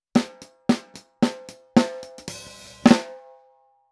MB 75 Mikrofon Pearl Snare 55x14 plus Hihat 5cm Abstand; the t bone

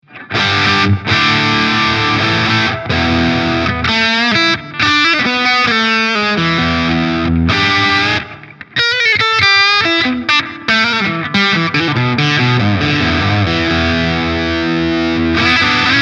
PRS SE Custom 24 Roasted Maple Steg verzerrt

